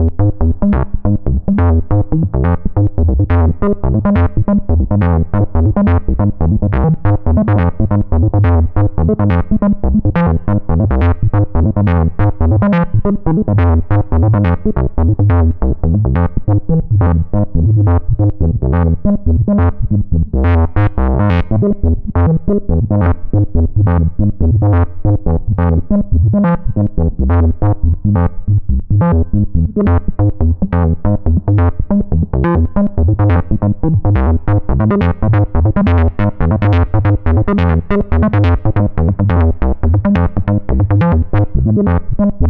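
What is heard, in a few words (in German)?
Pocket Operator Modular 400 SQR PWM ENV auf Filter, Synthesizer; Teenage Engineering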